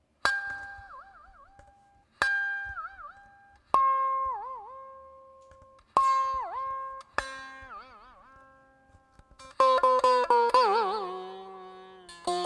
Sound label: Ektara